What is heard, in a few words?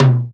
Old Skool House Perc